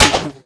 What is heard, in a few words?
Crossbow Fire; Playable Tutorial Level